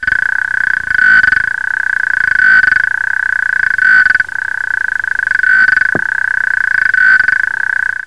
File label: male frog calling, clawed frogs